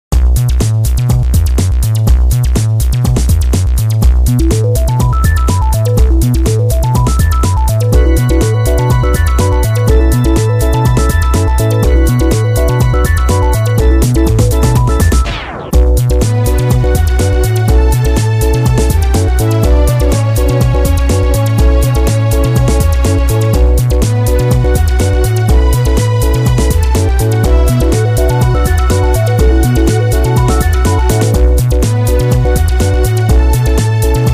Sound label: Spiral and Heartbeat; one hundred percent orange juice